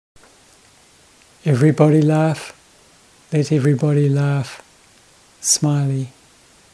laugh

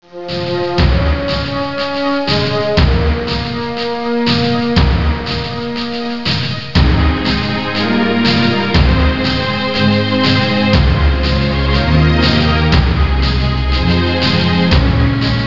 seal

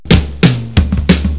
drums

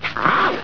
bark

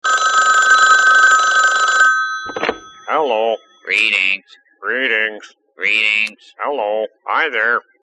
yip yip ringtone

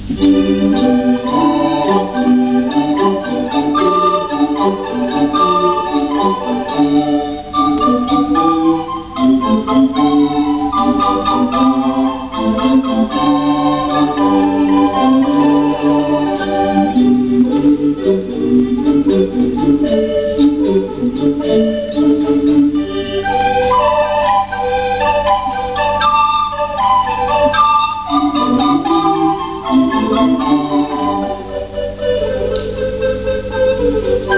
recorder orchestra

